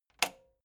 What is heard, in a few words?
metal button spring push